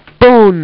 BONE

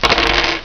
DROP

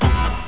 riff

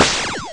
LAZER

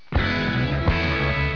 open

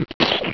mucus

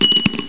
SHELL

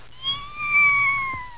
CAT

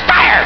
fire

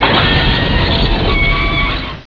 driving

